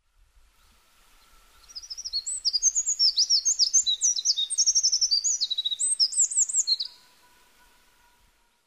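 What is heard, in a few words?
Eurasian wren; misosazai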